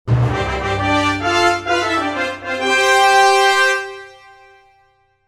アイキャッチ ラッパ; 商用利用可能な無料のアイキャッチの楽曲です

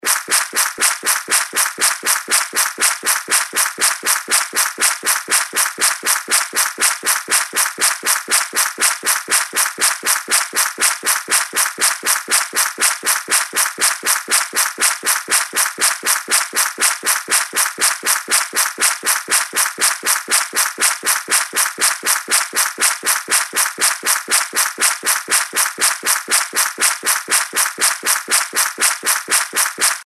足音 水辺 走る モノラル; 足音の効果音

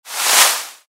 ページめくり モノラル

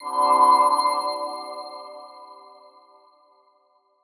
光魔法 大; 魔法効果音

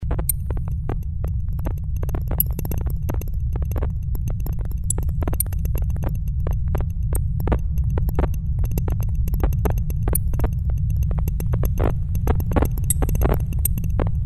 焚火

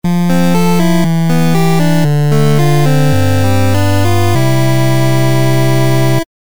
休む 休憩 寝る レトロ モノラル

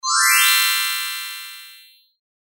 キラリン 上り

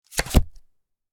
カードを裏返す; board game casino mahjong

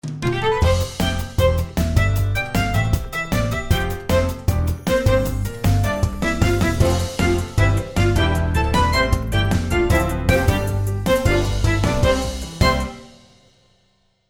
オープニング, アイキャッチ; アイキャッチの楽曲です。

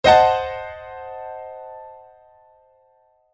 ガーン ピアノ 高音

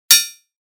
スピア モノ; 武器効果音

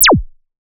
プチュン トランジション

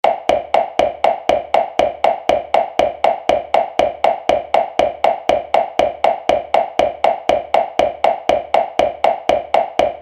足音 コンクリート 走る; モノラル

